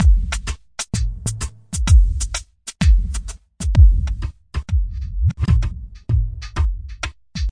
128 bpm, glitchy halftime dubber, drum loops and breaks